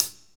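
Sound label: hi-hat, Techno Rock; Northstar; Drumscapes Roland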